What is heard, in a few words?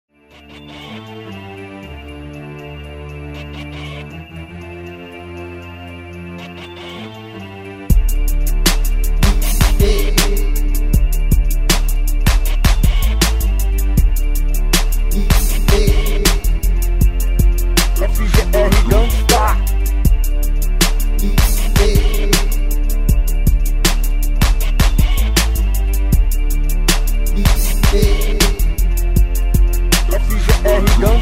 intro, gangsta rap